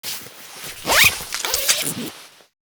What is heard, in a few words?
zippers and clicks